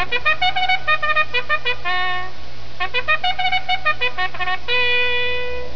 race horn; Motorola MPX200

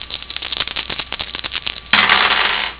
shake roll dice; Motorola MPX200

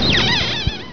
squeaky; Motorola MPX200

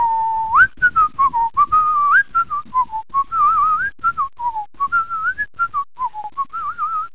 cartoon whistle; Motorola MPX200

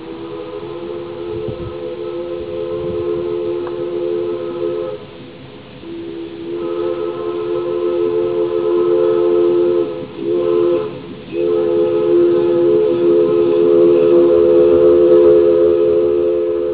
train arrive; Motorola MPX200

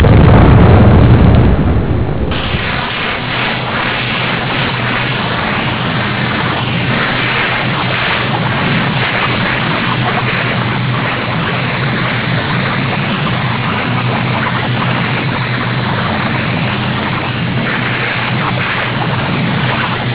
oil strike; Motorola MPX200

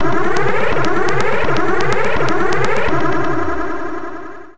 red alert; Motorola MPX200

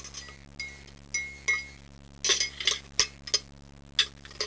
dishwasher load; Motorola MPX200

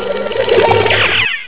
cartoon run; Motorola MPX200

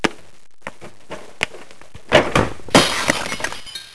stumble break; Motorola MPX200

